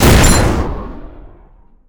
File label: Shotgun Shot Eject Ammo; Weapons